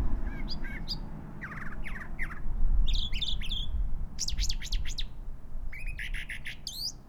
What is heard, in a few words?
mockingbird